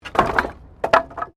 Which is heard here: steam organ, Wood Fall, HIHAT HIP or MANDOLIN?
Wood Fall